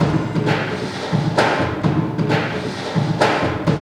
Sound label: LP, Tekno Industrial; East West